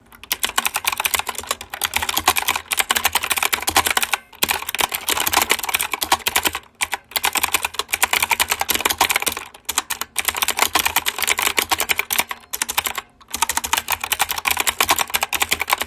Keyboard

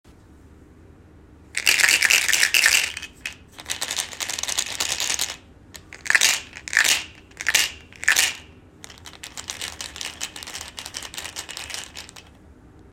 PILL BOTTLE

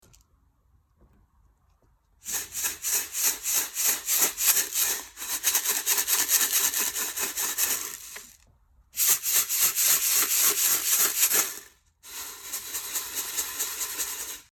paper instrument

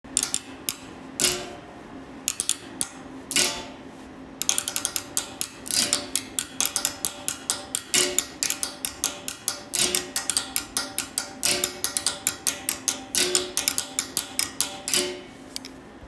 Dish rack

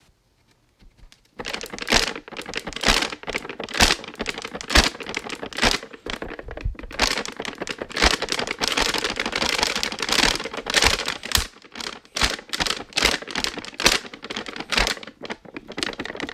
Paper Instrument

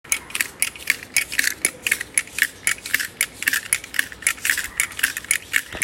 Peeler

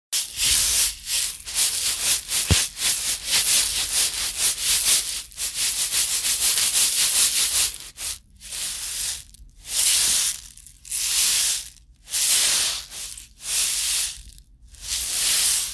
Sesame Seeds in a water bottle

